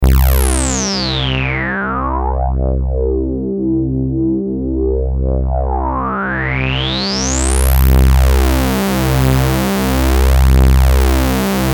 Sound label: VCF